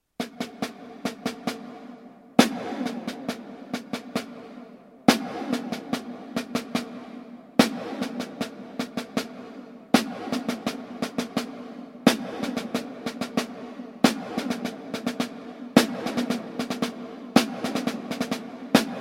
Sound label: double drag tap